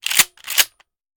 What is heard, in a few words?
Shotgun Foley; Sound Ideas; Guns